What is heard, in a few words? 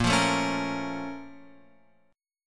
Bb Minor Major 7th, Guitar, Open F; BbmM7; strummed; JGuitar